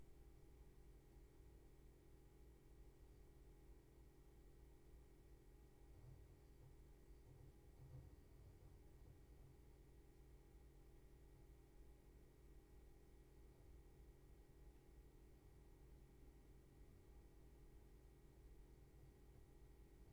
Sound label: room tone